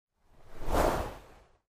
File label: swish back